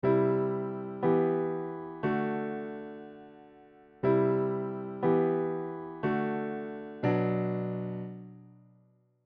pushed chords

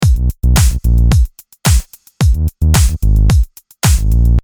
Cutting around 9dB 300Hz on the Synth Bass

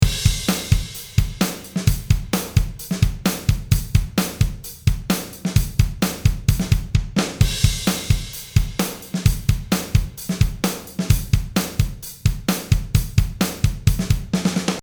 Drums Original

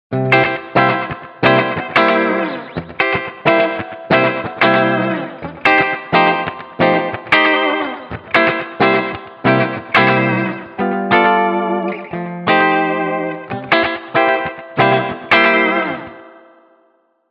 play with a vibrato

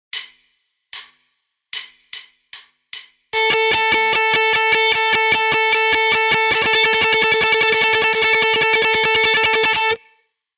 typewriter lick

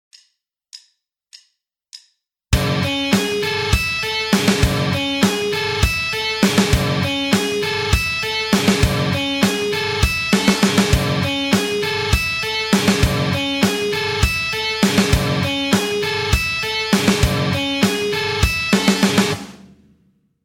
eight string guitar

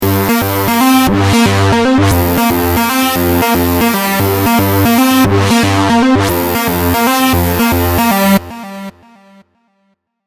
guitar synth modulation

